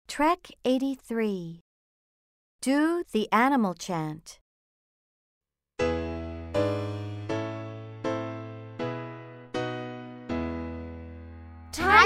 the animal chant

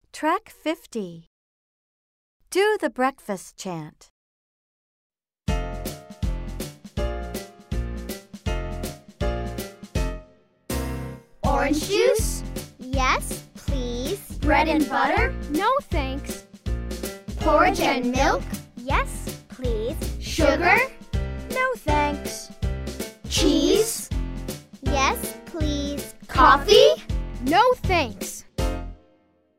the breakfast chant slow

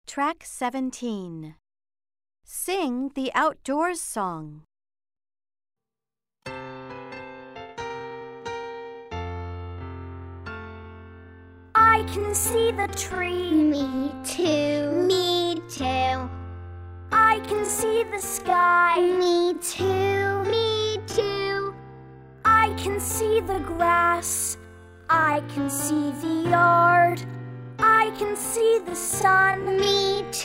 the outdoor song slow

